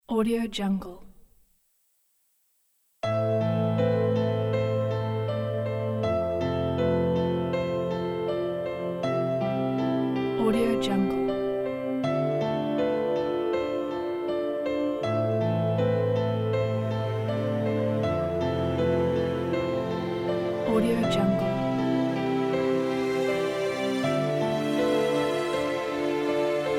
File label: winter forest